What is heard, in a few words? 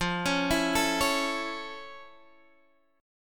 F Augmented Major 7th, Guitar, Standard; arpeggiated; 13,16,14,14,14,x; down